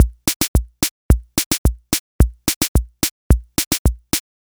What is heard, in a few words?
Enka